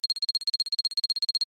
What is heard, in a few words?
digital typing; SoundsCrate